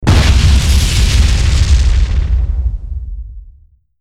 Sci Fi Explosion; SoundsCrate

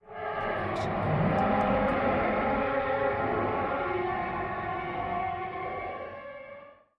Screamy Screetchy; soundscrate

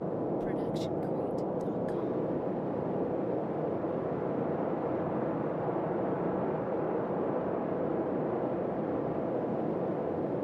wind flying; soundscrate

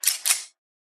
gun cocking slide; soundscrate

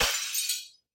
Window Break

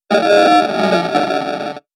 robotic glitch

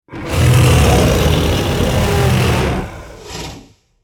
T rex roar; soundscrate

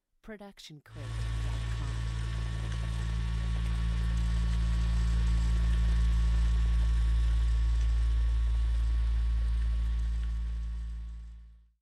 lightsword humming; soundscrate

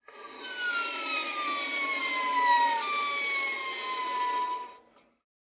Violin Seagull Effect

